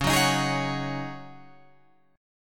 C sharp Suspended 2nd Suspended 4th, C sharp, Guitar, Open F; C#sus2sus4; strummed; 13,13,15,15,16,13